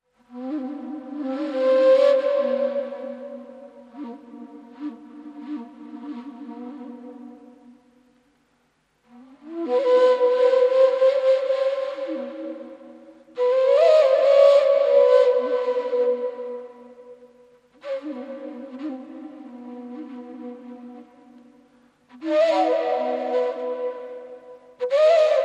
Indian Flute Solo

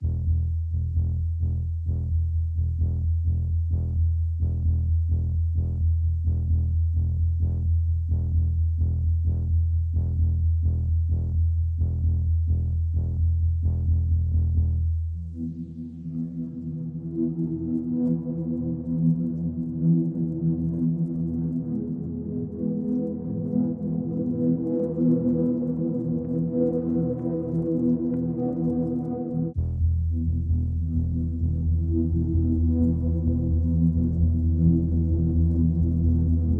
Underscore

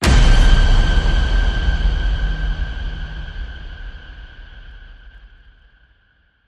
Hit Rubble

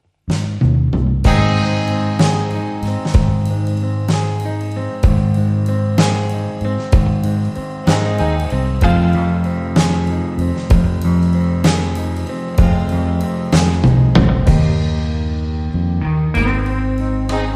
Clean Version Instrumental

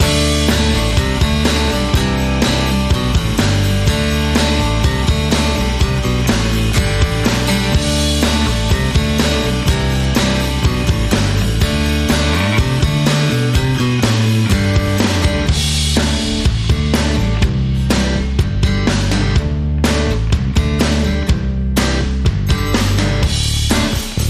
Banjo, Rhythm; 60sec

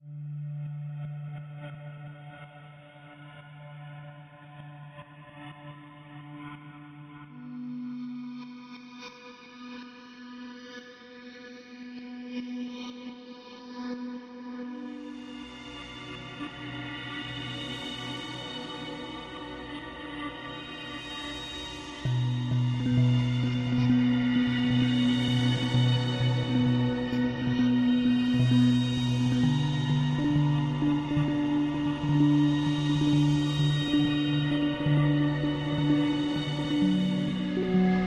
Drone, Light

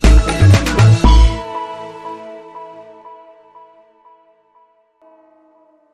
Hit

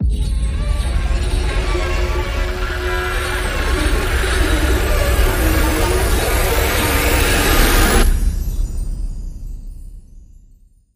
Twisted Riser; Not So Jolly

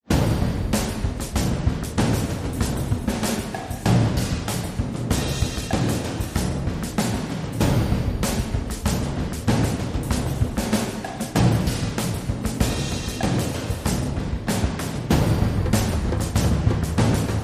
Action War Drums

